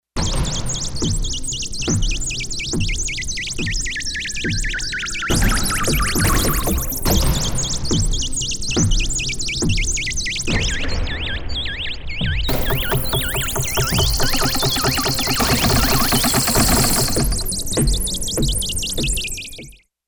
Pulses; Digital Synthesizer

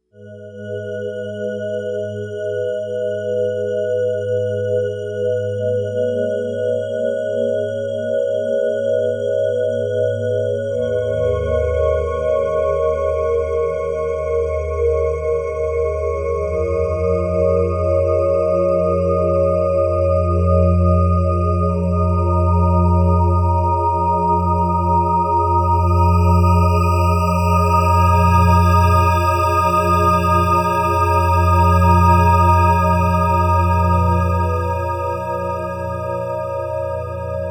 Astral Pad

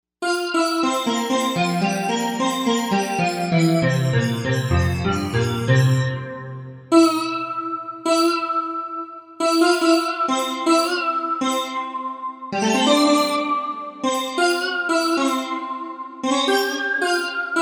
Sitar Synth, Novation UltraNova; Factory Patches

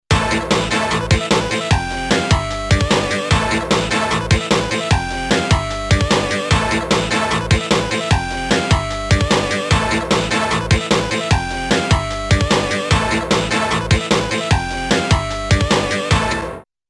90s Video Game

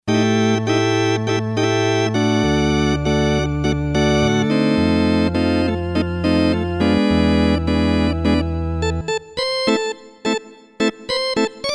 60's Organ, Roland SC-880; Factory Tones

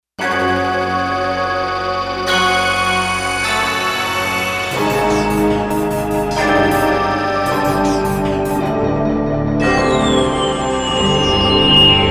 FX Menu

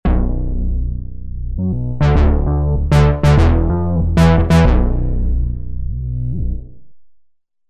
Roland V-Synth; Zero Thyme; Factory Patches

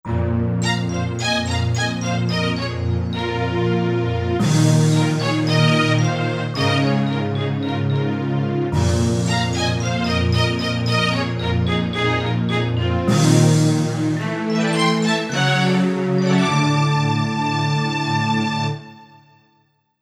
Bell; BartokBell

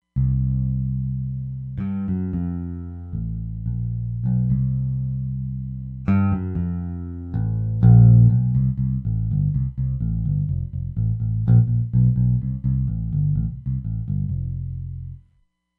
Bass & Amp

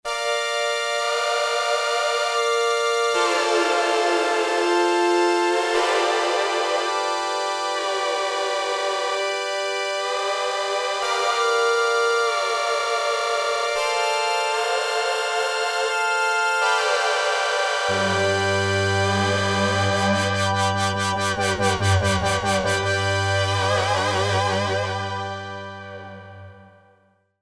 Percussion; Korg DSS-1 Sound Library; Soreing2

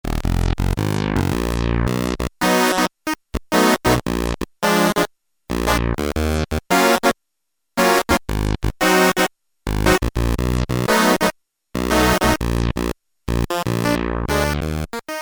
Synthe Clav 16', Korg Poly-61M

